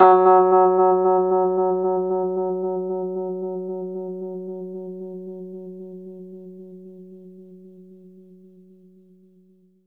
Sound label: Rhodes fast F#, 3D Keys; 3D Sound; 3D Keys VOL-1